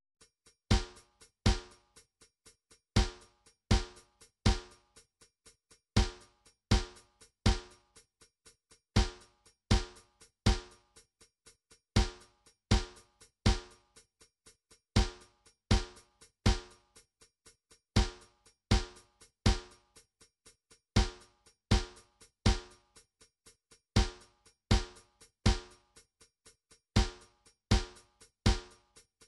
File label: drums, midi drum patterns